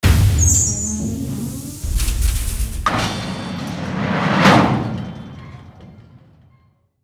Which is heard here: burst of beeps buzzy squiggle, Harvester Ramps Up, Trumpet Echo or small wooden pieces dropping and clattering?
Harvester Ramps Up